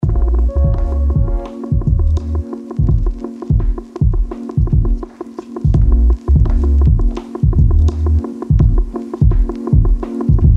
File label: Natural Swing LFO